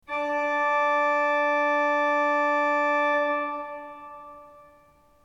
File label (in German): ton, orgel